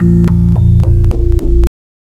DRAWBAR ORGAN; ENSONIQ

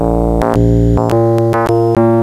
ENSONIQ ELECTRIC PIANO

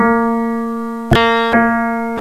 ENSONIQ PIANO ORGAN EPIANO VOICES

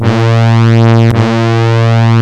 ROLAND BRASS; ENSONIQ

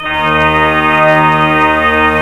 ENSONIQ FULL PIPE ORGAN

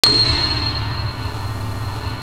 ENSONIQ UNPREPARED PIANO

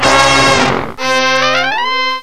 ENSONIQ BRASS FALL OFF BRASS GLISS

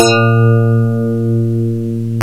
ENSONIQ DX RHODES

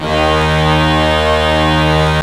ENSONIQ PIPE ORGAN BRASS

